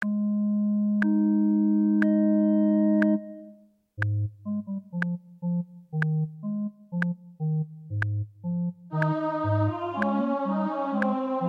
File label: O clap your hands; Gibbons